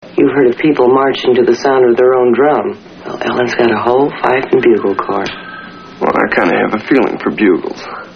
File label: fife and bugle corp